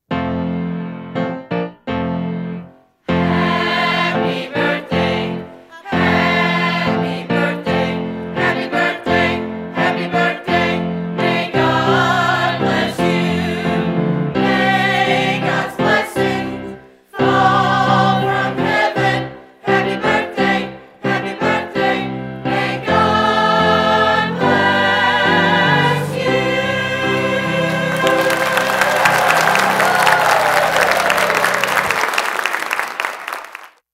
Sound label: Choir Happy Birthday